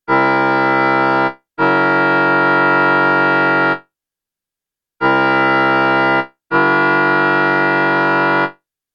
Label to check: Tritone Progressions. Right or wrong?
Right